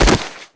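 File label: player bodyfall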